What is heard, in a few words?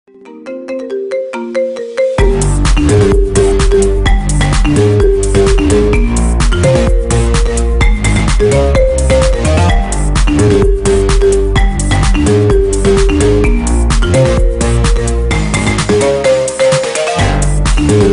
marimba ringtone remix